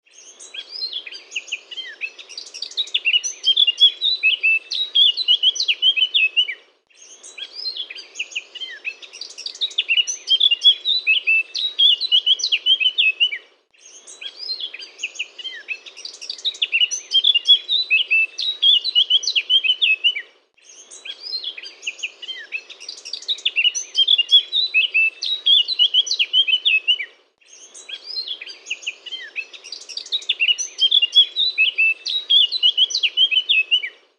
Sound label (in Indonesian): burung blackcup variasi